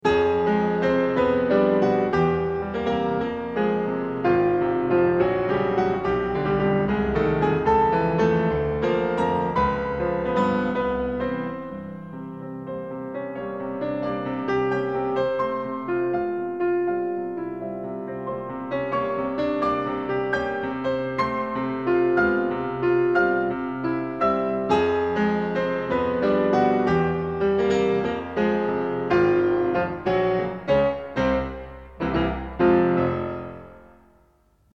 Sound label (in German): Carnaval für Klavier; Wilhelm Kempff; Op 9; Estrella